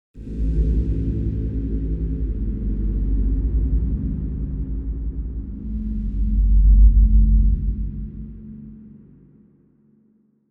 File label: Ghostly Transition